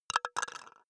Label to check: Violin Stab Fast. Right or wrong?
Wrong